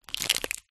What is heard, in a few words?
onion skin peel